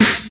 00s dry hip hop snare sample a key, Royality free snare sound tuned to the A note